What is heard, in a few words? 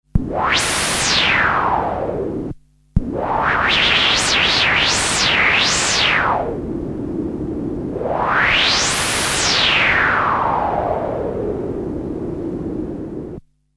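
Korg M-500SP White Noise; Traveler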